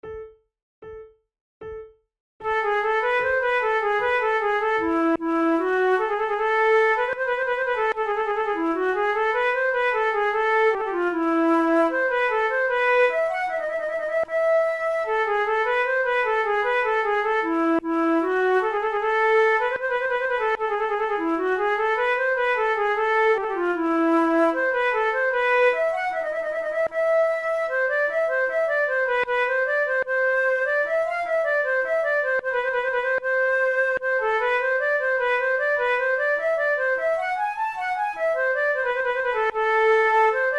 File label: concerts for two flutes; monteclair; la rieuse